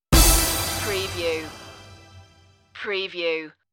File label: tv stinger